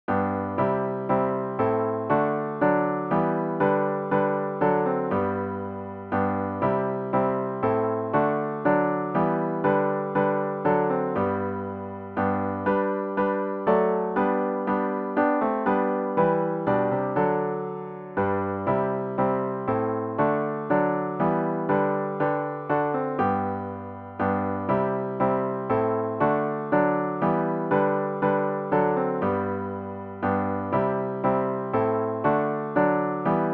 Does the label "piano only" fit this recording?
Yes